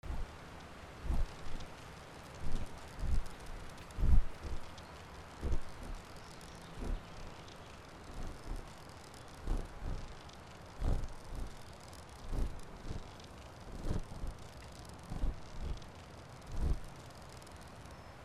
AMBIENCE

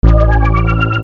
SCIFI

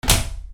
BATHROOM

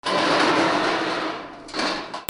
BANGS

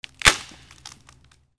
BREAKING

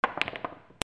BILLIARD

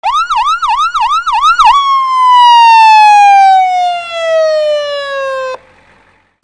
VEHICLES

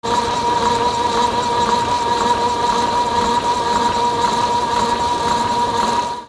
INDUSTRY

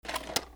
HOUSE

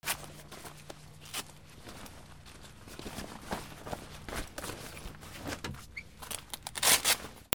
BOATS

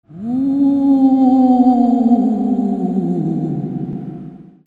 CARTOON

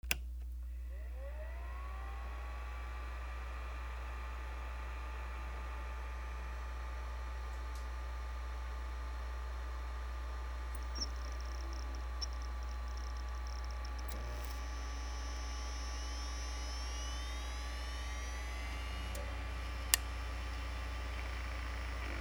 OFFICE

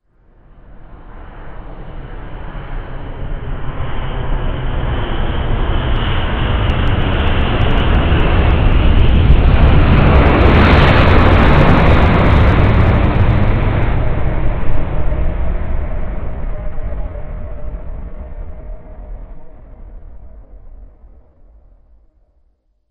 flight

